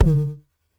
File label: Tom; Ableton Live 12 Lite; Core Library; Drums